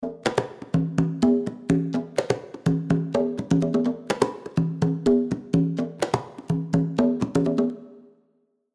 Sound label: Reverb ER On Plate